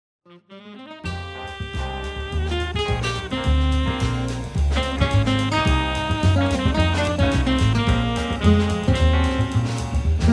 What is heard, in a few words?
samba